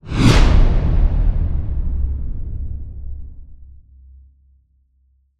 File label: Swell To Impact Flanged; bottlerocket